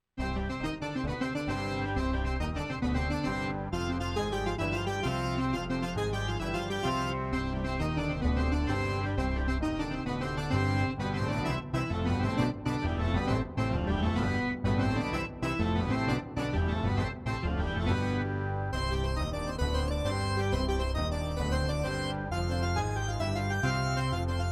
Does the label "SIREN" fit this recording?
No